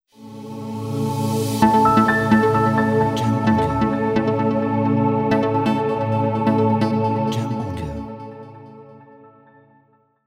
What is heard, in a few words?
Electronic Ident